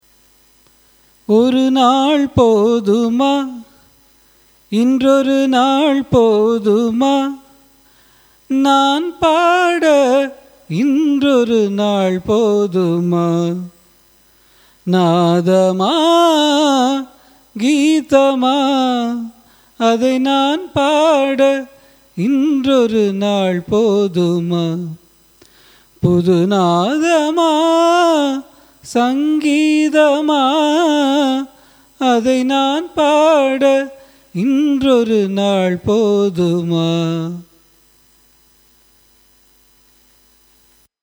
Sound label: raga maand cine